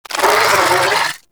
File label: Vocal Attack; Amalgam Moa Satyr